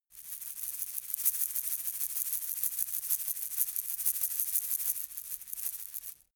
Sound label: shaker plastic egg rattle soft